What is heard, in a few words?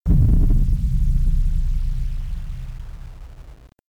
Planet Collision; Learn to Fly 3; Moonbreaker